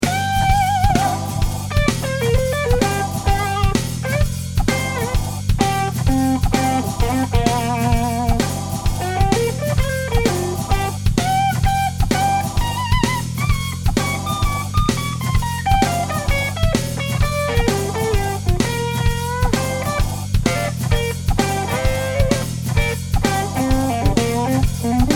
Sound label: Boutique Blues Studio